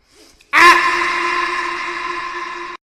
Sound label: Ahhh Disappearing Scream Meme Sound Effect